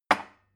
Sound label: glass put down cupboard hard